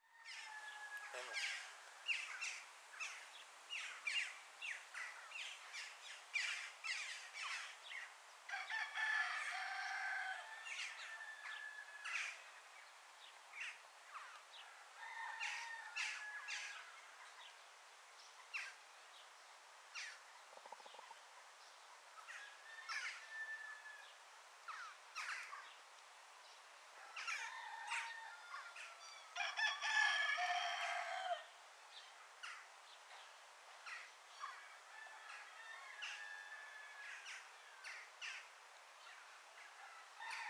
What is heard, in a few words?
birds sounds long